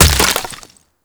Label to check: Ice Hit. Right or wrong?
Right